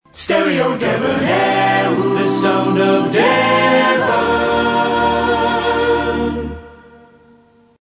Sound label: fast to slow, idents